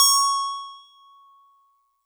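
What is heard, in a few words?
StarPiano DX100 C#0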